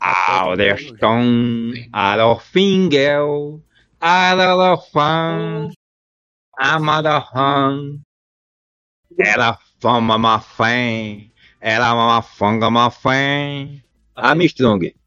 cantando

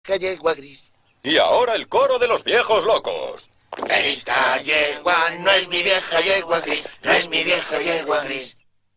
el coro de los viejos locos

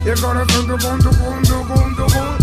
el corazon tucun tucun

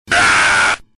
grito

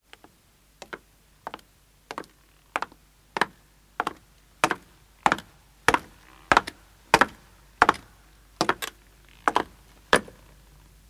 bajada escalera

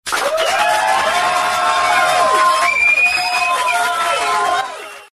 aplauso

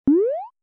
salto cartoon

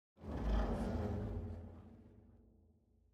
rugido

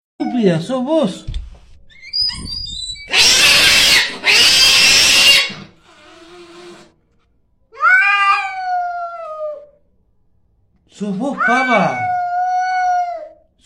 gato rabioso

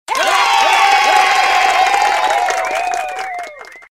aplauso

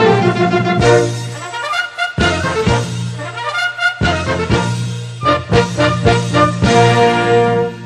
himno

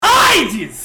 gritando

